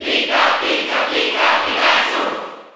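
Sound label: Cheer German, crowd; Pikachu; SSB4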